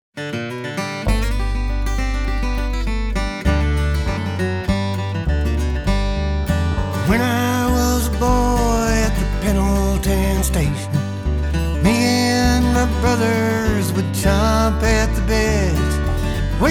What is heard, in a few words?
Airstream Band mix